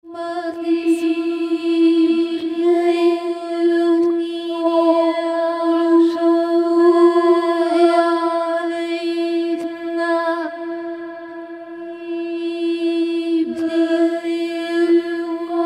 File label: mystic